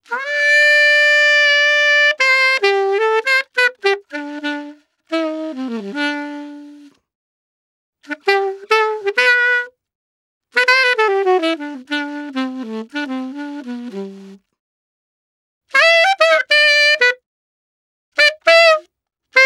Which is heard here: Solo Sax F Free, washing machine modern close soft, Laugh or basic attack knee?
Solo Sax F Free